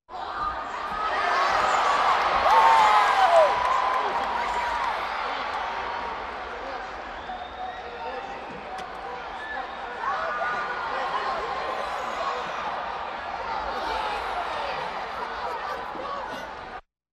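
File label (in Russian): шум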